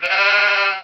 sheep idle; better fauna

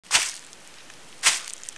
steps; DinkSmallwoodHD

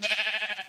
sheep idle; animalia

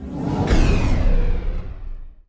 quick load; DinkSmallwoodHD

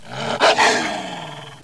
snarl; DinkSmallwoodHD

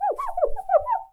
pencil erase; modcleaner

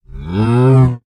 cow idle; animalia

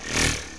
flyby; DinkSmallwoodHD

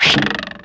arrow; DinkSmallwoodHD

